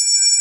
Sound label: G4 sistrum; NorthStar - The Wizard VOL-2; MYST